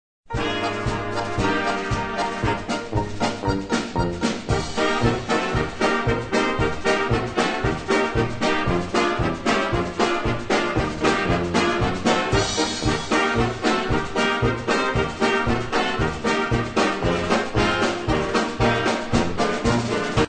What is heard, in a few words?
POLKA